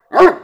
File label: bark; SuperPower 2 Redux V7A; chat